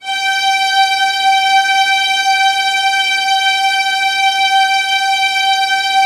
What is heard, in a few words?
VIOLINS, Strings; ILIO; Synclavier Strings